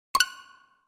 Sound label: App Launch